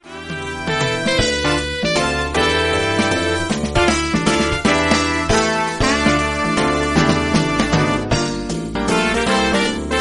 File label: Jazz Ringtone; Samsung